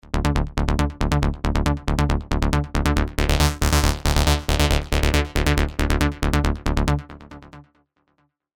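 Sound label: sequence, Bassline, Sylenth1; Conspiracy; alonso 19 year anniversary pack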